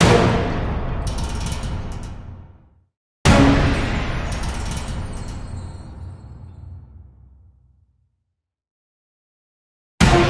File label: Win Sounds Big Win; Wicked Tales Dark Red